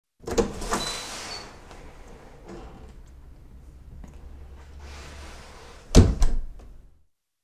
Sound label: Opened and closed the front door to the street; Открыли и закрыли входную дверь на улицу